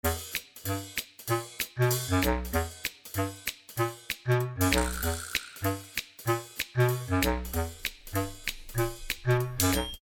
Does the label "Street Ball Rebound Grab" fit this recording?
No